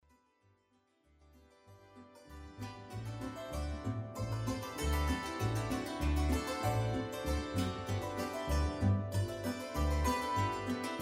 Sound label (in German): Kreiselpolka